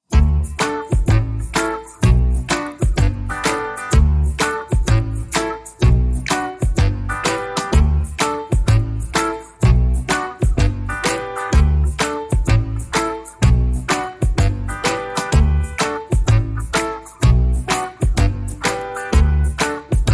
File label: No Lead Guitar No Glock